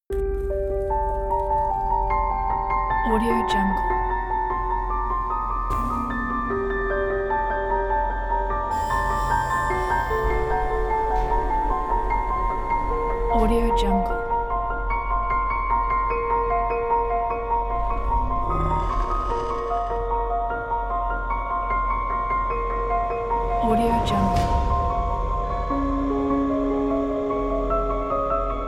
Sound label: suspense atmospheres